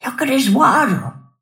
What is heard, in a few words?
move pain; snapfire